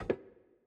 Monkey Stuck Pin; Puzzle

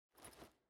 Foley Gear

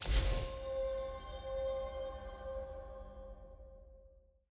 ui button click; spirit blossom

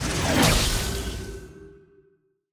Enemy Boss Teleport Out; bloom minigame

